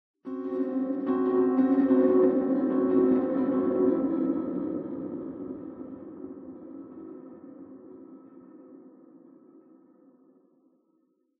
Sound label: Piano Disaster